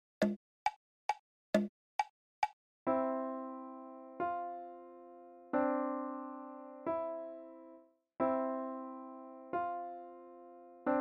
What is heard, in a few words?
Music Box left